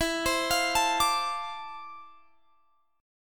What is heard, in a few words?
D-Minor 7th E, chord; Dm7/E; arpeggiated